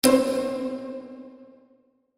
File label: Chime Musical, Chime Musical Multimedia